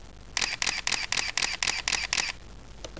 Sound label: Canon EOS 1Ds Mark II Burst